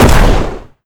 Explosion Small No Tail

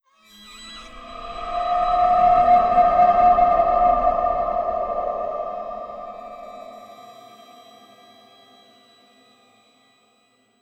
Egypte Intro

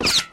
Bullet Hit; Mars Sortie

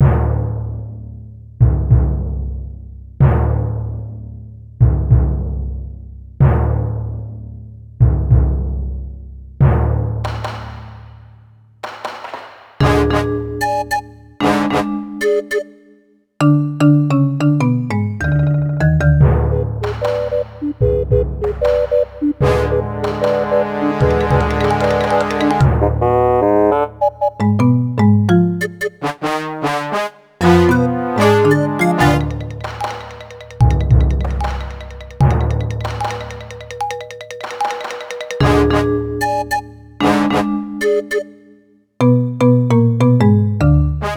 music forest bpm150; forest09 var4; Donkey Kong Country Returns HD

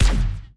Plasma Hit Main

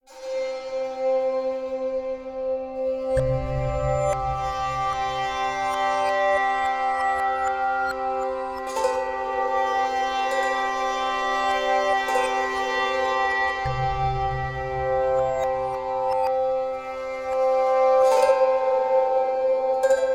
dreamscape; curious expedition 2 macos ps4 switch windows xbox one xbox series xs gamerip 2021

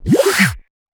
Menu Transiton Out

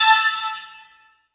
Sfx Golf

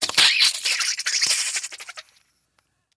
Kraken tent

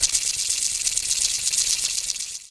Rattle Snake; rabbids multiverse; iOS, macOS, Mobile

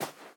Footstep Sand; viewfinder

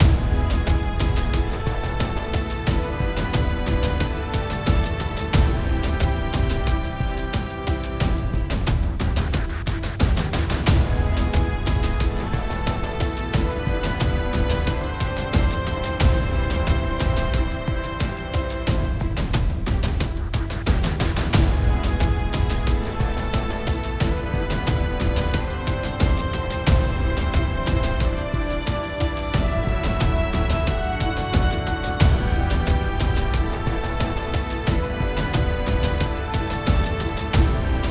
World Map Intense Major

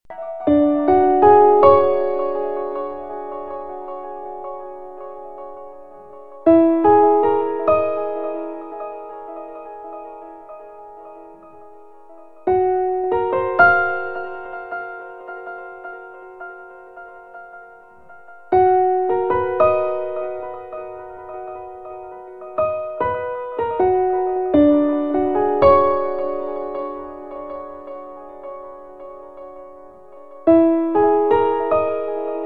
music (city 3 part melody); unsighted city

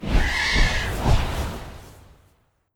Harpy Gust

